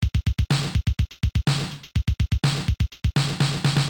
Nineteen Rhythm

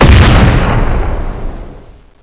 Explode; chasm

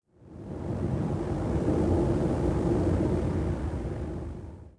WIND, wind; zones

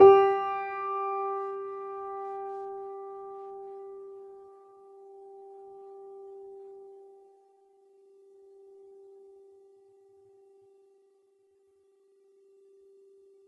Wurly Upright Soft G3; Pro Sounds Sample Collection

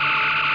Phaser; dosgames; phaser2

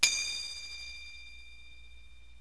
chime; Multimedia Cube

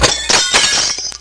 Smashing Glass; Smashing Glass 1

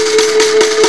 CLUNK

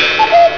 CUCKOO; rclock

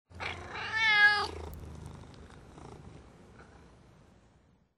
cat; WMBonusXP

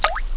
DRIP; Duke Nukem 3D Kill-A-Ton Collection

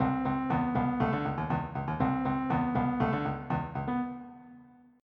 Funk

